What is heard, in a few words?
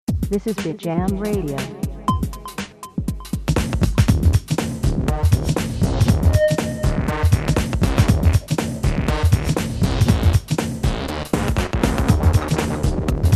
Jingle; Bitjam